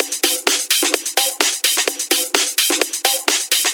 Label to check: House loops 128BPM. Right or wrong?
Right